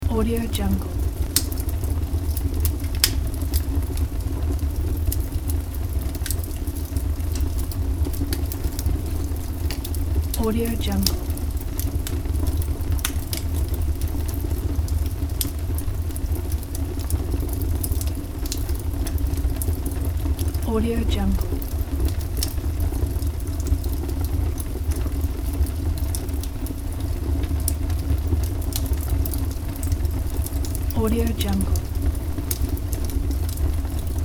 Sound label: fire burning and crackling in a fireplace loop